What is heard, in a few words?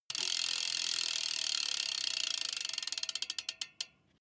bmx bike freewheel push and slow down stop